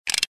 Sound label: Flicking Sound; LG GS500 Cookie Plus